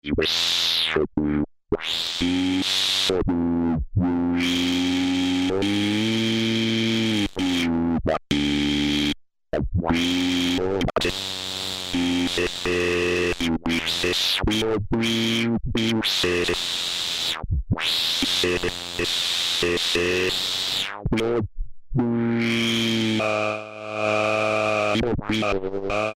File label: Phoneme-anon, Alesis airSynth